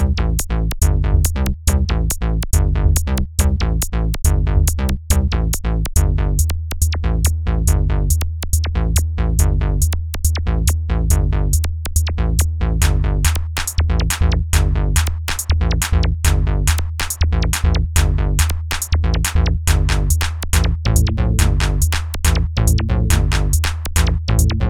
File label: Old School Sound